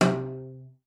C3, CELLO FINGER; Xsample VOL-5

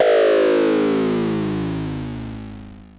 FX, Dual Percussion Synthesiser; Klone

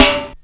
Rimsd, breakcore percussion drum hits

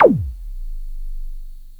thump

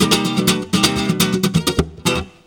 GUIT BREAKS; Best Service; World Colours

